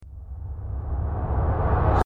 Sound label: dramatic buildup whip